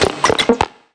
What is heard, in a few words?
computer; GONNER